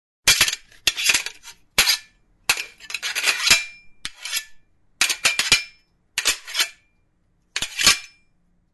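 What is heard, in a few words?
zvuki metalla, metal sounds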